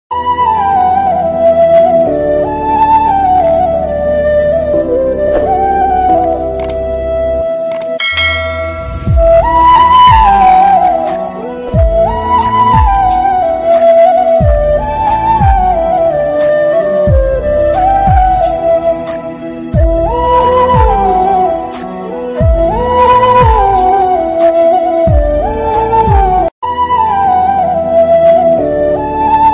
bhakti ringtone romantic ringtone basuree ringtone flute ringtone; dharmik ringtone; tik tok ringtone